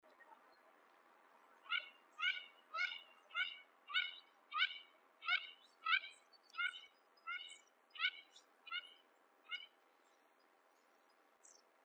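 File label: Mandarin Duck; オシドリ; 雌？; 兵庫県西部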